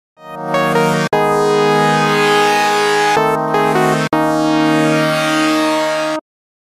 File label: Jingle (PA Version); porkchops adventure; Hanover's Jingle